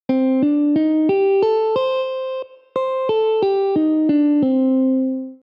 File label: Shivranjani; scales